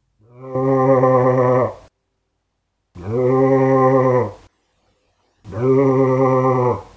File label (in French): mouton